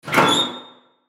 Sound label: Locker Open Metal, Locker Open Metal Squeak; Miscellaneous Sports; SoundEffects-Blastwave